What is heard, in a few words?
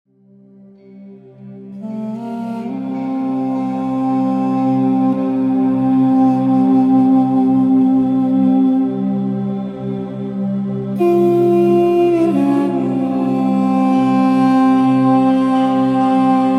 Mystical Flute